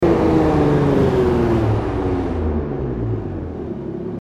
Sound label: throttle off; vehicles; golf3; TDMCarpack; TDMCars